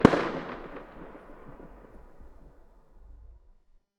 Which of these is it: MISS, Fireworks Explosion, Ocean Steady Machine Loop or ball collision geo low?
Fireworks Explosion